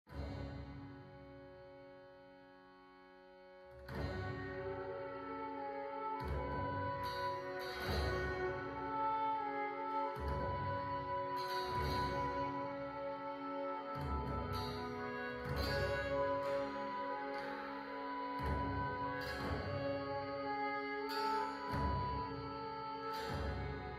Instrumental; Banner Saga 1 2

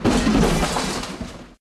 crash

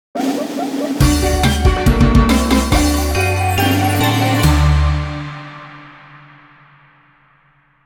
Victory! Fanfare; Tribal Hunter

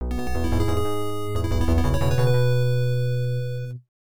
Clear Fanfare; The Return of Ishtar; PC-9801

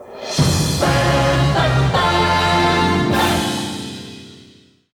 Goal Fanfare; crash bandicoot series sound box; Rings of Uranus

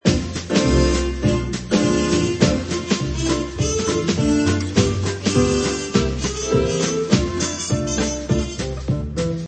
Gramophone Charleston Music; crazy machines 2 gamerip

